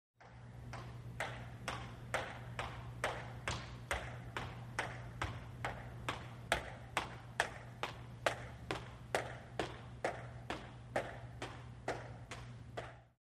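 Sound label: Footsteps High Heels; heals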